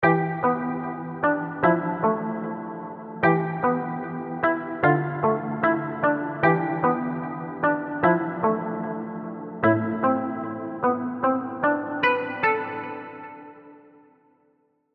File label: Guitar; Alone; Ice'd Out Collection